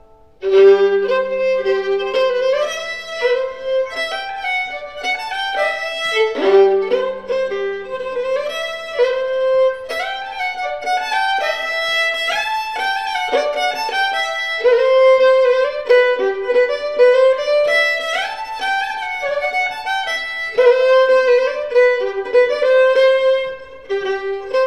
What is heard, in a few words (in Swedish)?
polska; Pa rosorna; e Spak Erik Sarna